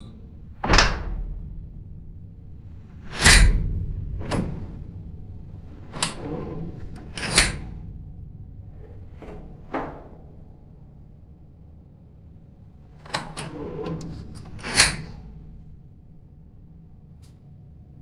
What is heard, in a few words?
heavy metal submarine hatch closing; boats